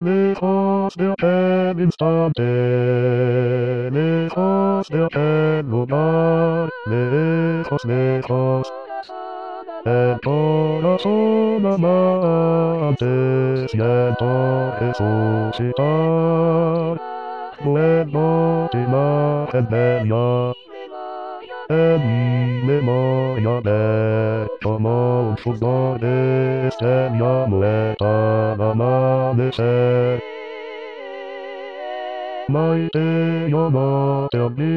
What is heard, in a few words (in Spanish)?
voz; Coro "Con dos Bemoles"